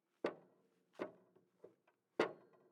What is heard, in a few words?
Thuds